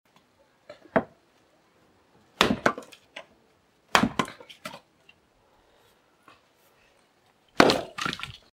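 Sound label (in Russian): kolka drov, chopping firewood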